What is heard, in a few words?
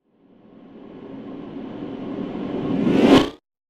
hit for teaser; ضربه برای تیزر